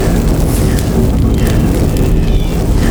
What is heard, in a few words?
burning agony loop; Dr. Mundo